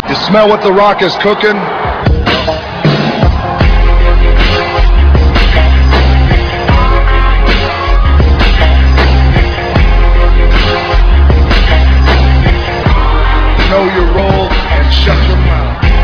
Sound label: rock